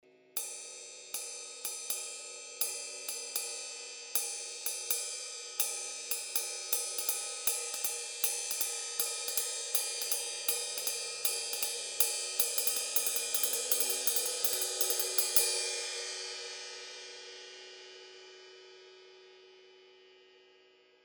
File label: 22 I Ride ride pattern, cymbal sound